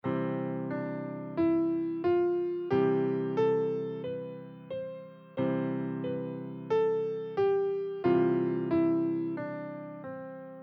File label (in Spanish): triada do mayor